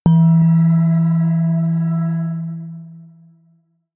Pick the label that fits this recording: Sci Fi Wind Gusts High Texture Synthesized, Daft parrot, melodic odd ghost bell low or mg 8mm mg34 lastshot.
melodic odd ghost bell low